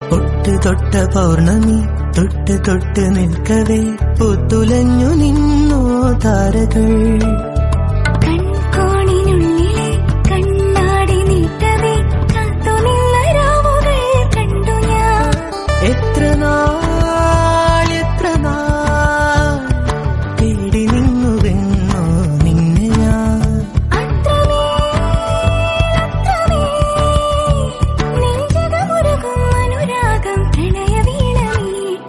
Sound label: malayalam song; hridayam